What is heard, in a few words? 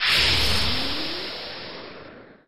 Missile Launch; Missile Launch 3